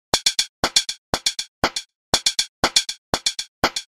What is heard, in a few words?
loop rithm delayed stick; Sound Graph